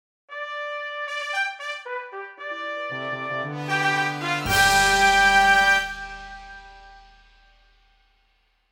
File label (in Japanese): ファンファーレ